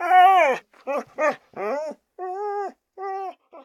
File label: panic, dog